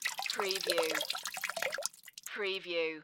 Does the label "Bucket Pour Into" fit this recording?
Yes